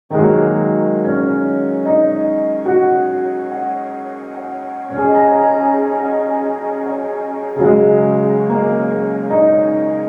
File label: Ambient Piano